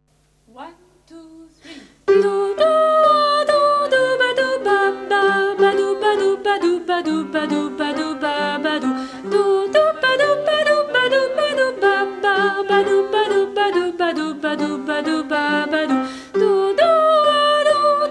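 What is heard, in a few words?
Bourree soprano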